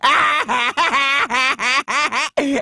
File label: laugh short